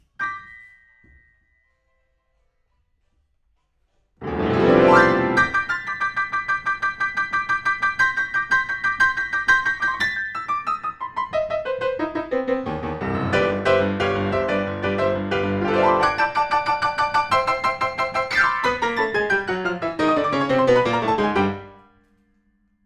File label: PIANO, RNR for piano